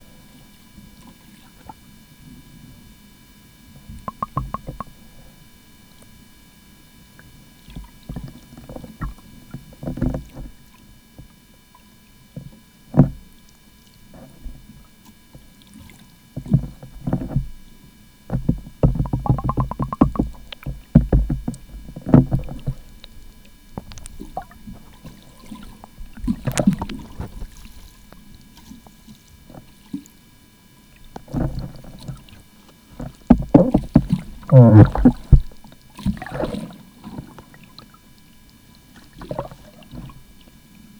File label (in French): ecoute parabole; Voile'Ocean